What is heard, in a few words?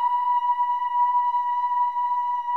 CHOIR OOS, B5; Spectrasonics; Symphony of Voices